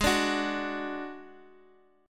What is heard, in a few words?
D-Major Flat 5th, G sharp